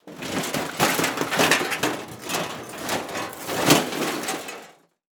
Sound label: Crash Aluminum And Metal Rocking And Dropping On Concrete; Crashes